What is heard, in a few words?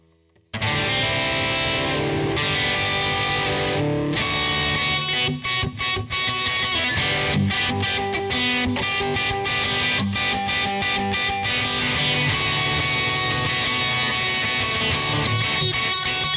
electric